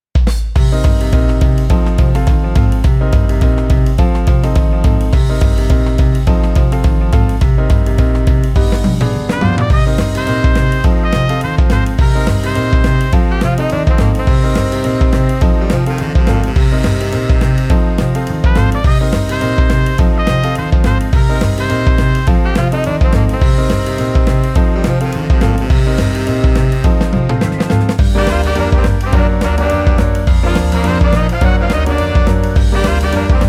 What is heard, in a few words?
Simple Swing 210